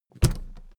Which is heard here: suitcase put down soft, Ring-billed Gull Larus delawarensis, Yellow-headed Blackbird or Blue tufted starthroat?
suitcase put down soft